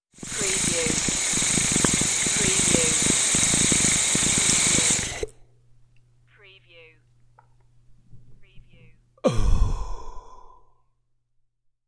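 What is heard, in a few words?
Tobacco Bong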